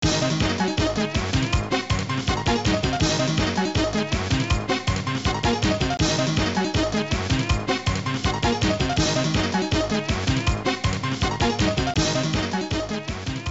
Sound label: Main BGM Intense; Vs. Super Captain Flag; arcade